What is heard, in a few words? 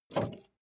car bmw e46 exterior bonnet unlock